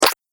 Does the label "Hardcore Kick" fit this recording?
No